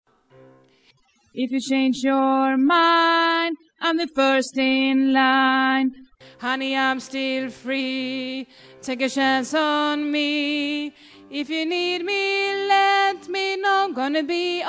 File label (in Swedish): alt, stammor